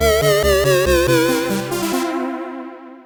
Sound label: arcade game over; arcadejett; The Touryst; PS4 PS5 Switch Windows Xbox One Xbox Series XS